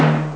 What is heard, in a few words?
desert gong; Amiga Soundtracker Sample Packs